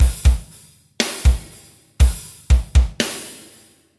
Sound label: metal